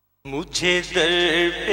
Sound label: Urdu Naat; Owais Raza Qadri